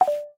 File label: Accordion Close